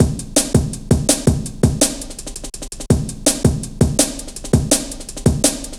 Ruffneck, Drum Loops 165.5

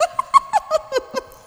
laugh; Sonic Foundry (Sony Creative Software)

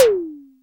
Tom2, Clavia Micro Modular

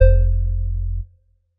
bass classic F; Polyend; ARC Noise

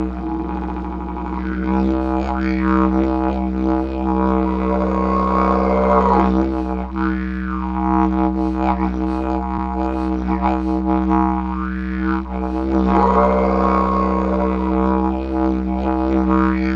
Digiridoo

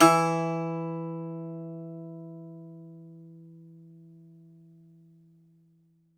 Greek Bazouki, plucked strings; E-MU Producer Series Vol. 7 – Old World Instruments

